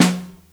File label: Snare Mono; Ableton Foundation FreePacks; Snare Designer